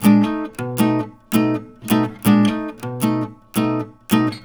RIFFS, Blues Guitar; ILIO; Fretworks

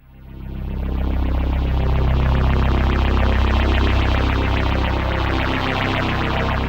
PHASE PAD; Best Service; XX Large Pads

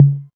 808 Tom Mid; Novation Drumstation V1

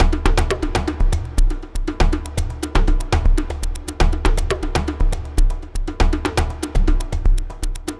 Terrestrial Hybrid, Processed Percussion

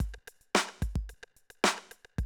Classic DrumLoops, 110

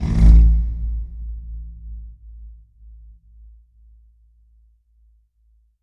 Pipe Rub; Effects

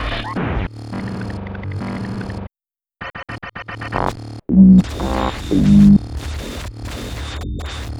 Rhythmic; Sonic Foundry (Sony Creative Software); Underground UK House & Electro

